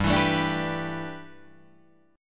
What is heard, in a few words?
G 6th Add 9th G Guitar Drop D, chord